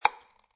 Cup Small Empty Place Down, Foley, Cup, Plastic, Small Empty Cup Being Placed Down On Concrete; Set Down